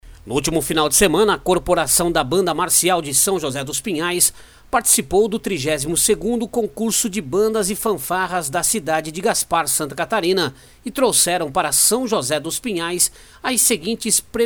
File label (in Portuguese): Banda Marcial